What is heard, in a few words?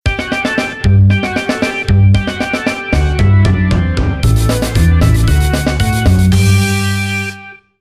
Jingle, ENDING FROG; Frogger Helmet Chaos; gamecube